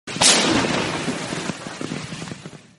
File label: leopard 2 sound